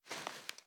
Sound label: reload fast cloth; gmod